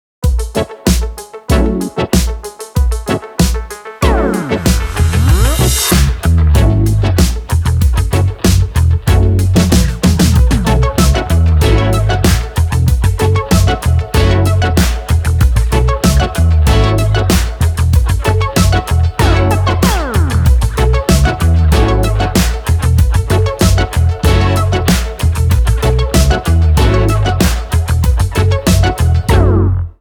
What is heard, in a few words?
GOOD TIME GROOVE (INSTRUMENTAL)